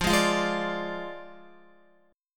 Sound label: F Minor 6th F down Guitar; Fm6 strummed